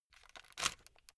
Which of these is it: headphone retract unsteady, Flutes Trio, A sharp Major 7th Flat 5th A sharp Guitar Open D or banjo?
headphone retract unsteady